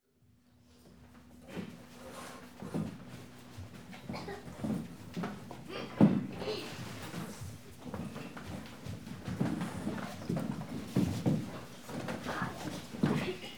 学生安静进入教室、坐下

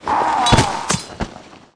壮男 死亡 倒地

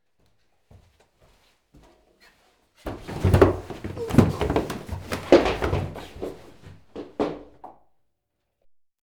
摔下楼梯(男人)

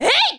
女人嘿哈声; renlei

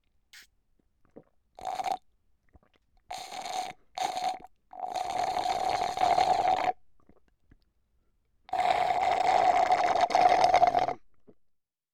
通过吸管嘬允、饮料

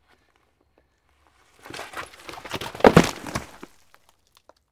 摔下山坡

